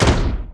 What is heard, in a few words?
Shotgun